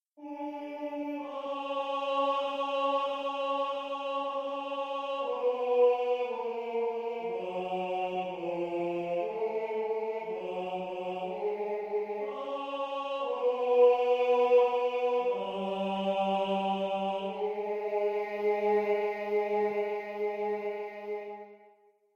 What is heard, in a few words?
Swing Low Lead, barbershop tags